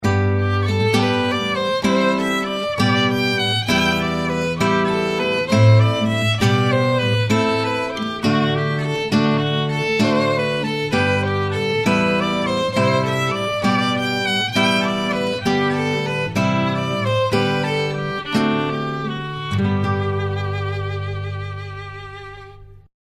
Violin Guitar; Classical; Violin Guitar Musician

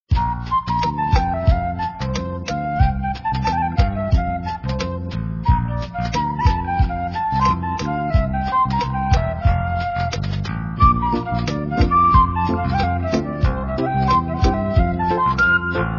reggae

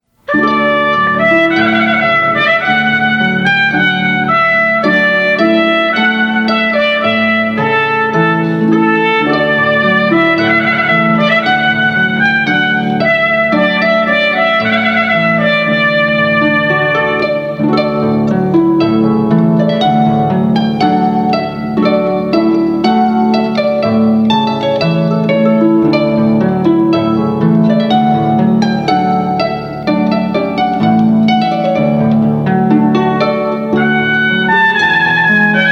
Ceremony Music, Trumpet Voluntary, harp

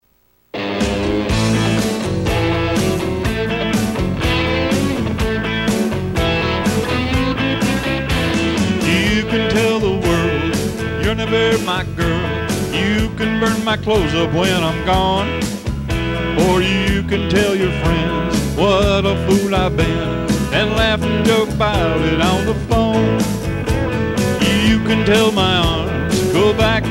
A Little Country; Sinatra Impersonator

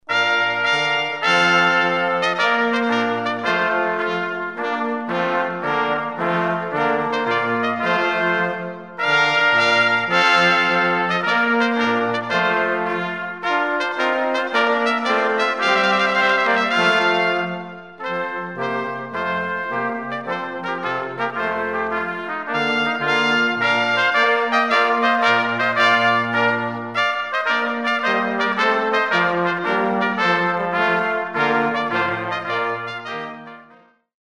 brass quartet; Trumpet Tune

